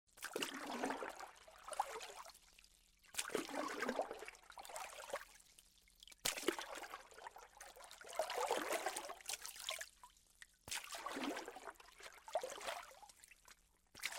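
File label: light splashes and lapping of water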